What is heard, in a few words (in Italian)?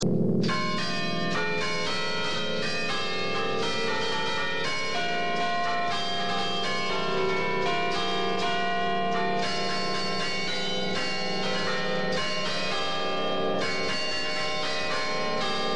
campane